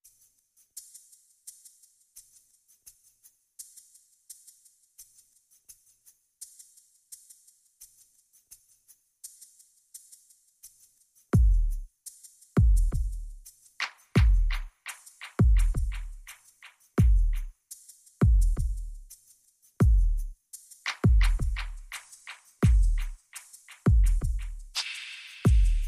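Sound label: Percussion Only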